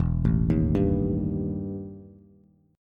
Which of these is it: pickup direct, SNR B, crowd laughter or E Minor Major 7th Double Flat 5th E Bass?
E Minor Major 7th Double Flat 5th E Bass